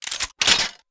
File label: Clawshot Set